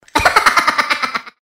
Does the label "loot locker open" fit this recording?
No